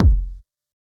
Huge Kick, Fm; drum single hits one shots; kick drum samples

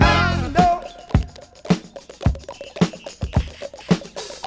107 bpm drum beat f key

